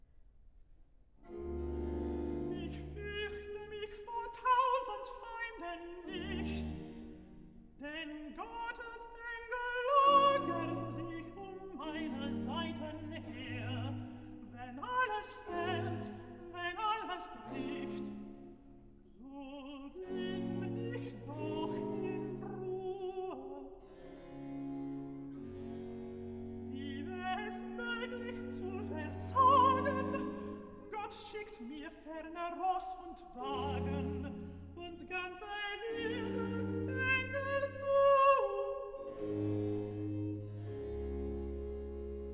Cantata, Recitativo (Alt); BWV 149 'Man singet mit Freuden vom Sieg'; Bach; Bremen; Feast of St Michael & All Angels

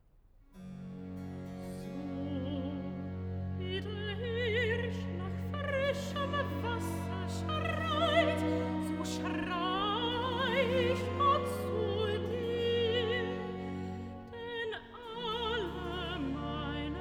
Cantata, Recitativo; BWV 148 'Bringet dem Herrn Ehre Seines Namens'; Bach; Lund; 17th Sun after Trinity

